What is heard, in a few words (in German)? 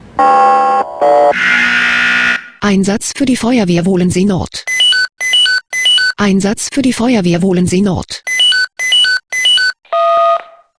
SMS Alarmierungstone; FW Wohlensee N